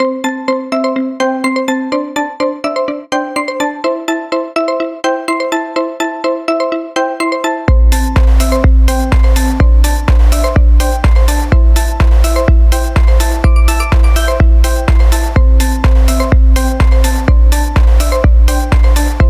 Uplifting Marimba Ringtone

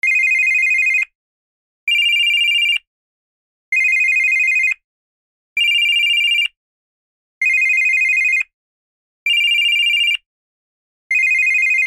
Classic ring

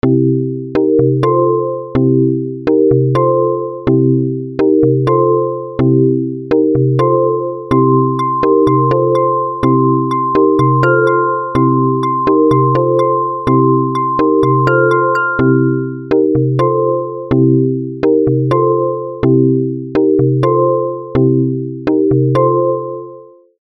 Marimba Chords Ringtone

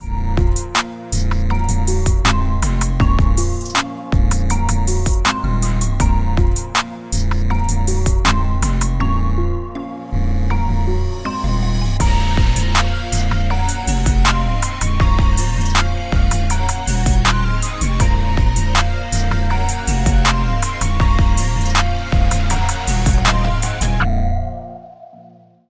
Hip Hop Instrumental Beat

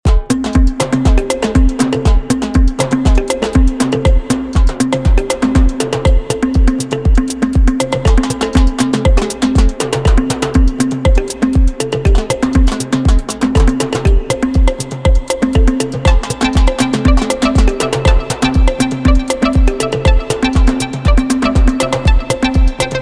Latin Vibe Ringtone

